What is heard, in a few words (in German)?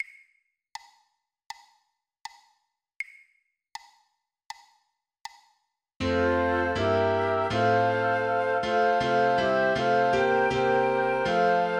Flöte Klavier; Once in Royal David's City; weihnachten